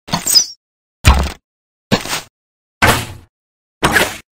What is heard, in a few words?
arrow